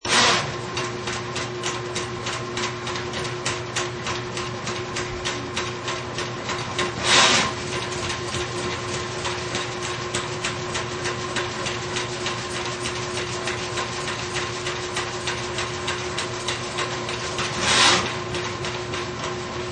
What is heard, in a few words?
Motorized plumbing snake machine running loop, Occasionally retracts snake; Motorized plumbing snake machine running loop, Occasionally retracts snake, Engine rumbling slightly with rhythm; Power Tool; Tools